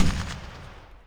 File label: 50cal ringout; WAR